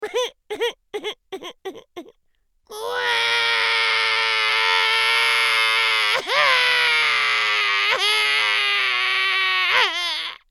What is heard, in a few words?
Cry Cartoon